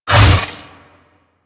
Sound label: Hammer Shield Whoosh A; Crp Hammer Shield